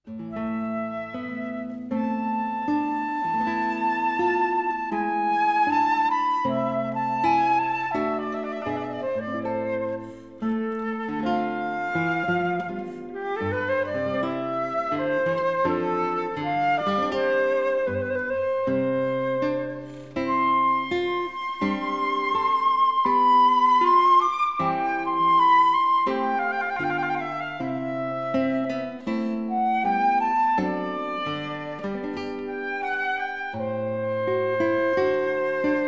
Flute Sonata in C Major, Adagio; Bach; BWV 1033

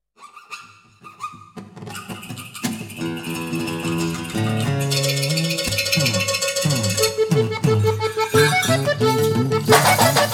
Jingle Bells

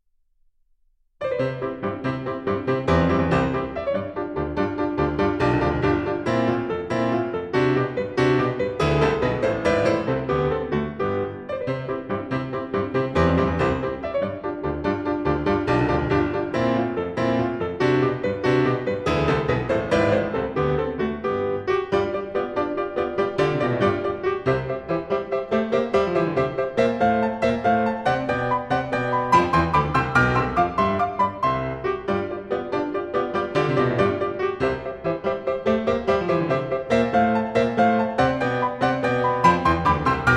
Waltz in C major; Variations on a Waltz by Anton Diabelli

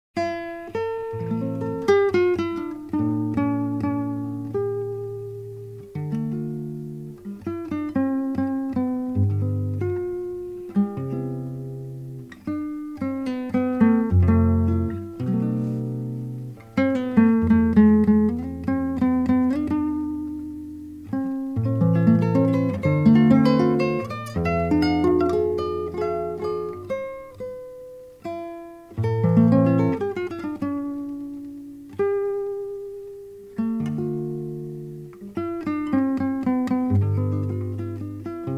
Variações em Violão [Variations on Guitar]

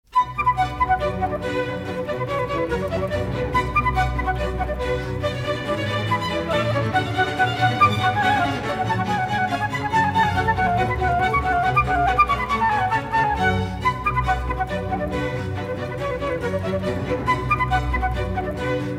Suite No. 2 in B Minor for Orchestra; Bach; BWV 1067

